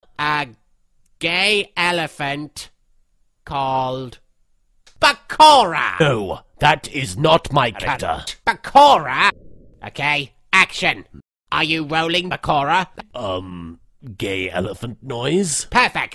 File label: Anime Song